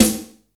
bright snare drum sample a key, Royality free acoustic snare sample tuned to the A note.